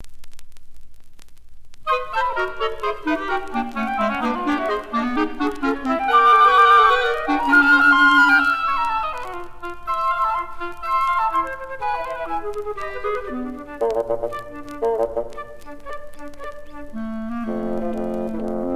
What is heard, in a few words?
Five Pieces For Winds Instruments & Percussion; Caprice